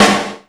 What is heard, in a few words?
DRMS, Hip Hop; USB Soundscan vol.02; AKAI